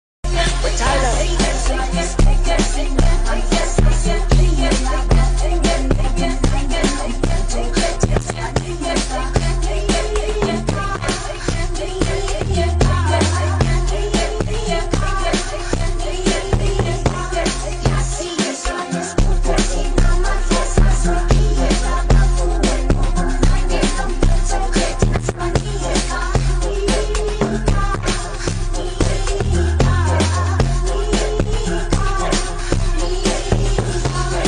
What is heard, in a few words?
Amapiano